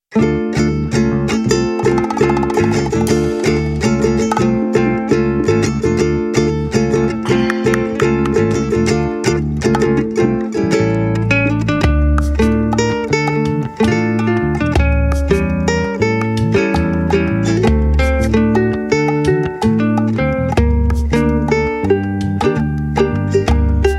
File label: kawai; prelude